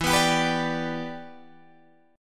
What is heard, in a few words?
E Minor 7th, Guitar, Drop D